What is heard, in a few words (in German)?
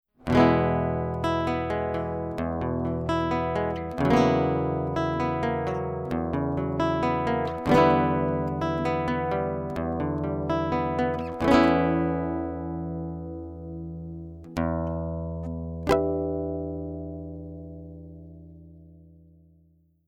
Akk Gitarre Preamp; Digi002